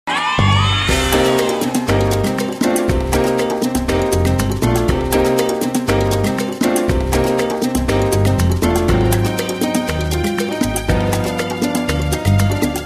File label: latin dreams